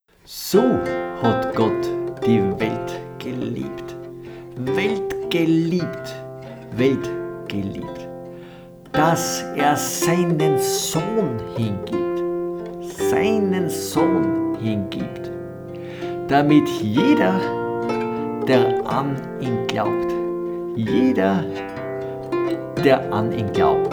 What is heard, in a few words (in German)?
Christliche Volksmusik Lied